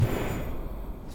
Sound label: Swing Speed Fast